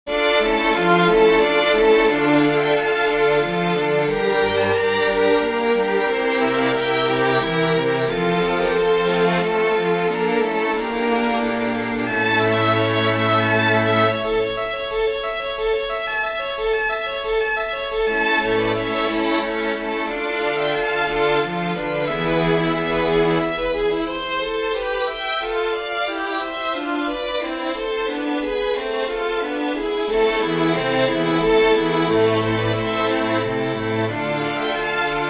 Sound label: for string ensemble; Johann Sebastian Bach; sanctus from the mass minor